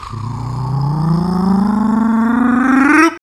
Human Perc, Body Perc; Spectrasonics; Supreme Beats; World Dance

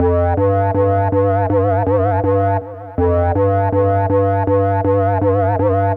synth, Hardcore Breakbeat 161 Bmin; Big Fish Audio; Hardcore Breakbeat Action

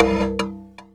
CHASER FX

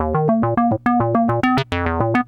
PLACID A 105, SYNTH SEQ, Trance; AMG; Global Trance Mission VOL-2

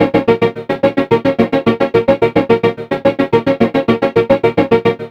STRINGS SEQ R; USB Soundscan vol.33; AKAI; Disjointed Textures

